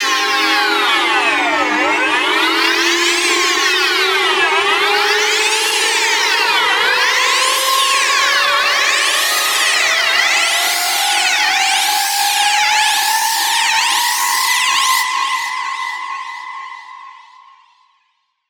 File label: 8 bars, turbulence